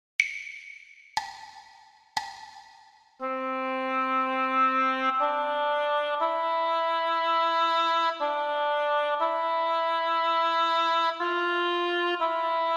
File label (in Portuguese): percepcao ditado